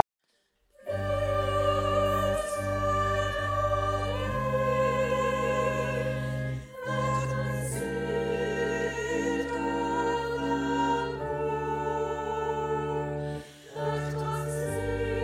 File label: Soprano